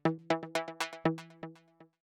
suoneria botton; beep

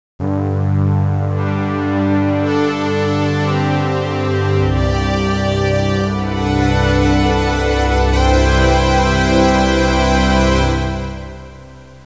audio suonerie musica mistica

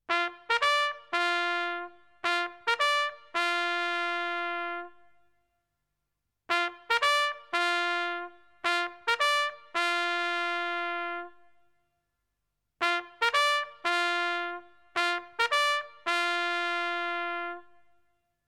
suonerie tromba militare

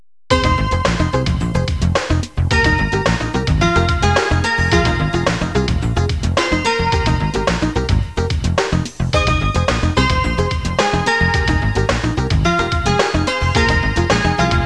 suonerie businessy

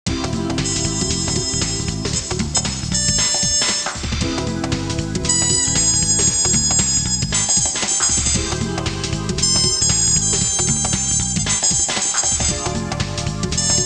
suonerie musica jazz

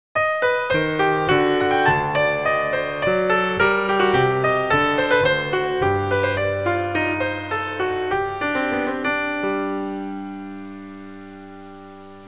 suonerie classica; musica classical

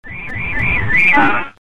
suoneria botton; beep

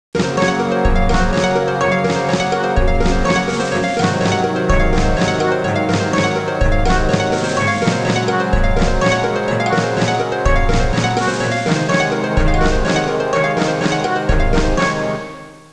musica elettronica; electronic

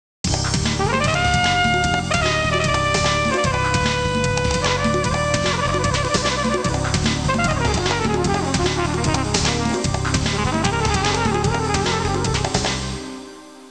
suonerie musica jazz

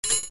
suoneria botton; beep

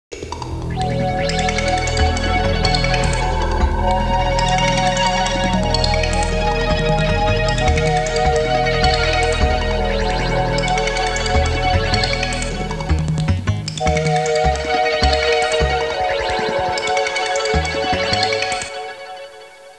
musica elettronica

